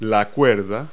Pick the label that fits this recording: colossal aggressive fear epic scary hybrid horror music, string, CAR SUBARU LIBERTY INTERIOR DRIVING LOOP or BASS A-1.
string